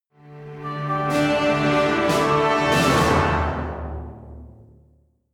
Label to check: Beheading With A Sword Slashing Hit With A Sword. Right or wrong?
Wrong